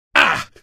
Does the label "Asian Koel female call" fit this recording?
No